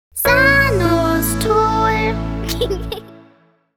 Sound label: Jingle; Sanostol